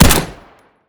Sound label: fire, uzi; weapons; Urban-Coalition; Decay; arccw ud